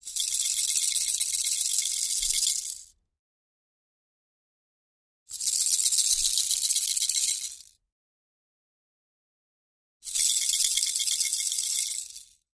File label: Toy Rattle X3, rattles